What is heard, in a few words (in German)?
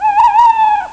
kaeuzchen; ST-XX Amiga Soundtracker Sample Packs